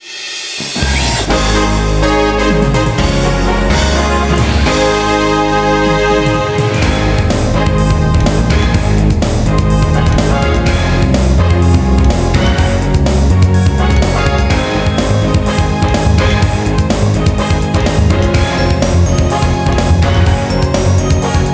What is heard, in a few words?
victory major